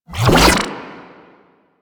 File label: Shock Rifle Laser Retract, weapons; Warframe; Corpus